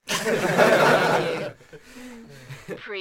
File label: Crowd Laugh